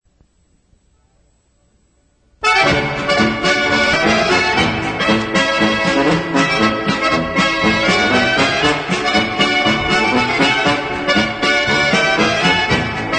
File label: POLKA